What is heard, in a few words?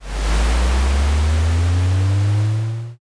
rocket launch